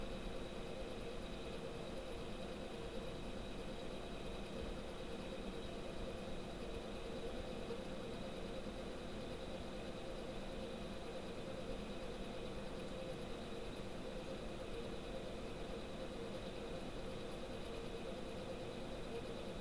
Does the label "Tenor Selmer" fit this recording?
No